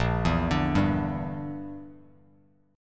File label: A sharp 6th Flat 5th, Bass Standard; A#6b5 arpeggiated; JGuitar